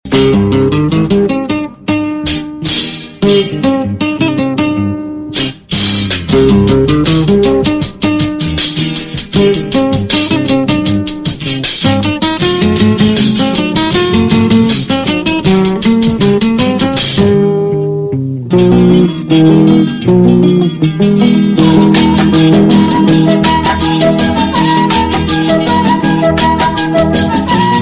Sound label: Guitar Piece